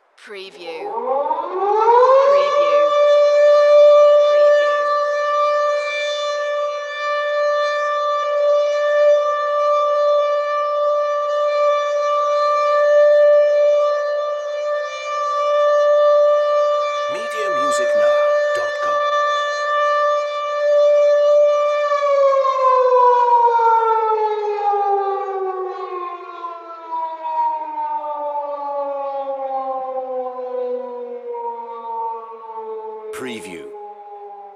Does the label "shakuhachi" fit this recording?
No